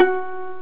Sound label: pluck